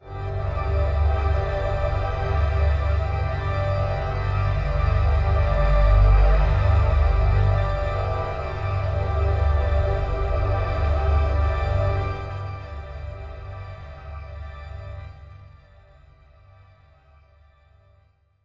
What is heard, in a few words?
One Shot Drone G, dystopian drone; One-Shot Drones